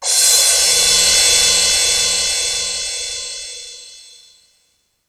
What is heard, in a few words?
Roland R-8 Crash